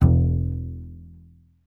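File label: contrabass E; Miroslav Vitous; Solo Instruments VOL-2; Solo 2 Bank 1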